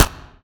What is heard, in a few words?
npc smg2 fire; weapons